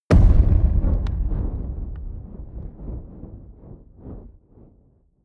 fire impact long; explosionen; comedy-cartoon; soundpool

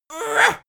imp hit; schreie

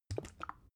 bottle syrup upend soft

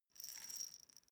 small glass pepper grinder turn slow

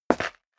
step leather shoes concrete grit single right; schritte

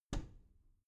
bathroom cabinet door open regular

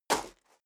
step high heels gravel medium single stair down; schritte; Footsteps; menschen

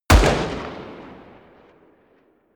revolver distant shot; schusswaffen

